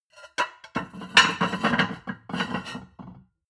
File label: plate on plate place multiple, restaurant cafe